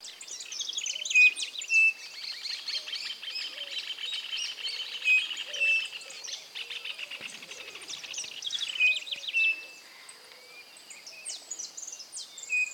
crespín voz, aves

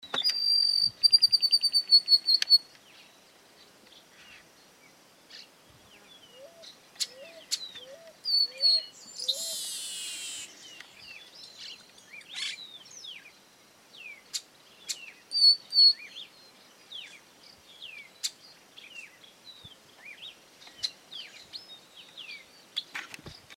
Voz varillero congo; aves

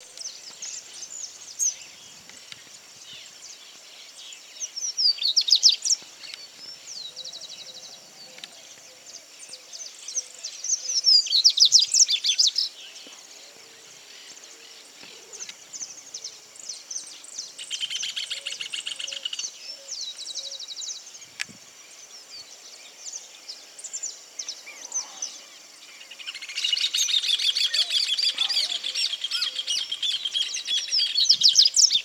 Voz corba; aves

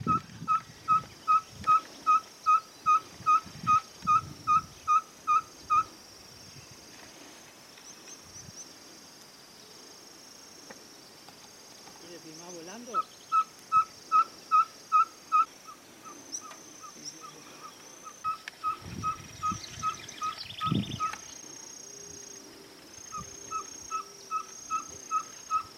Caburé Chico voz, aves

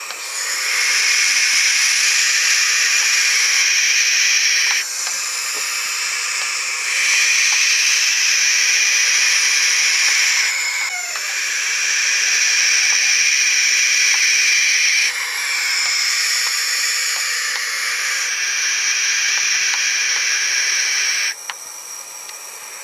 Lechuza de Campanario Voz de pichones, aves

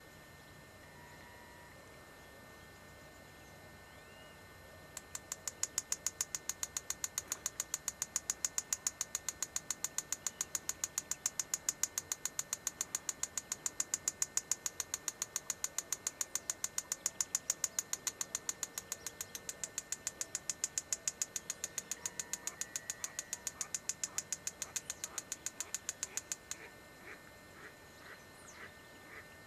junquero voz; aves

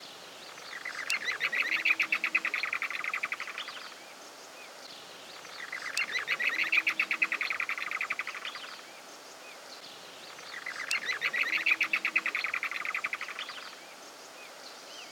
chotoy voz; aves

